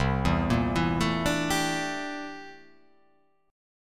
C 13th C down; C13 arpeggiated

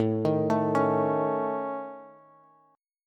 A Major Flat 5th, Bass; AMb5; arpeggiated; JGuitar

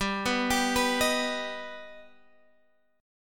G Augmented, Guitar, Drop D; down

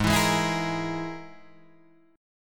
Ab Suspended 2nd Sharp 5th Ab, Guitar Drop D; down

